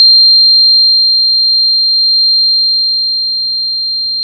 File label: Fundamental Frequency